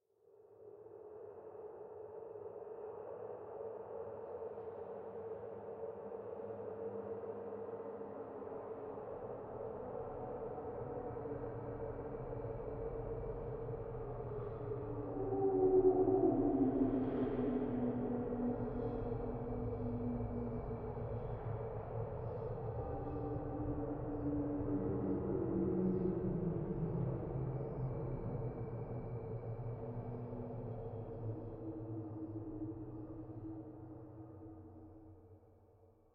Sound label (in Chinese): 诡异通用, 环境音